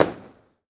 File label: Sharp Explosion